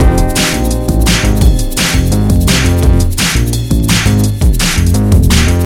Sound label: Beats Original